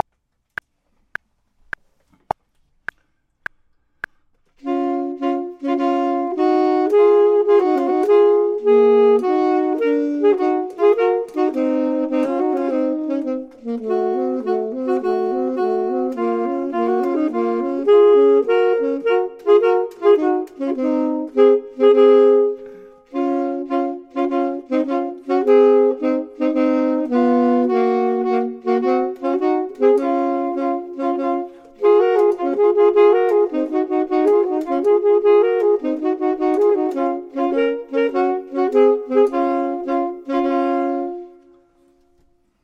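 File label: both, Saxophone and Clarinet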